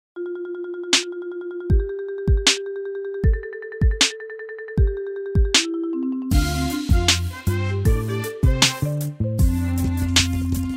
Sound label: animal feet Instrumental